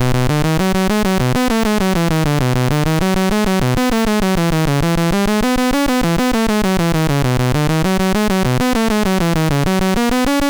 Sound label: Musichetta BASIC, MOS6581R2